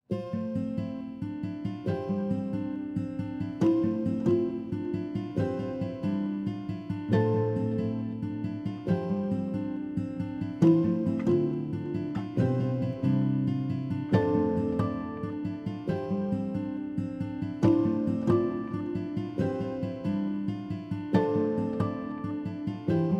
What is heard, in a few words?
Three Guitars Acoustic Arpeggio